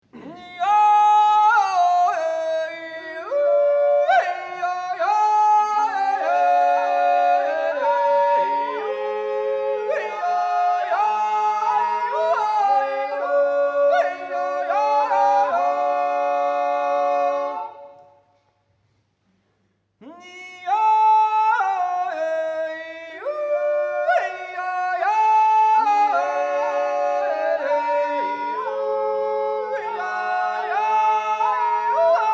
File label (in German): Naturstimmen